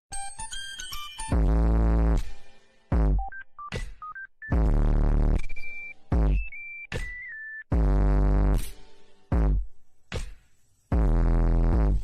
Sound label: bass boosted; omni man thicc